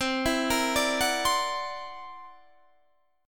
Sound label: C 9th Flat 5th, Guitar Drop D, chord